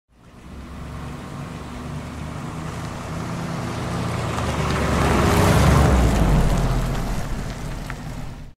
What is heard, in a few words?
machine